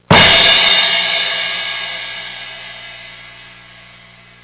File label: cymbal crash, percussion instruments; Sound Effects for Multimedia - Volume 1